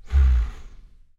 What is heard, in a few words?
bear